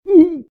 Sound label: about To Drown; RadwinIsland